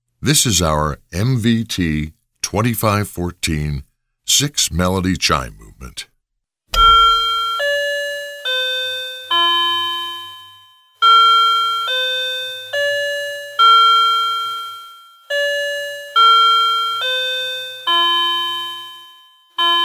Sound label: Melody Chime